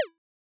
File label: collapse, retro